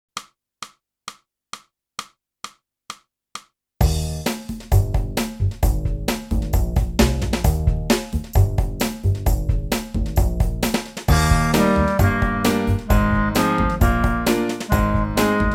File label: clarinet; boosey method